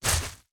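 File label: Hands Lift Medium Mud Dry; BIGMOUTH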